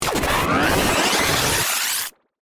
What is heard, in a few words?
Power Up Static, Stutter, Digital, Ascend, Radio, Transmission; Production, Element, Imaging; Tech Sound Effects